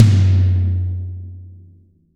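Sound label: 14 tom; Best Service; Real Mega Drums VOL-1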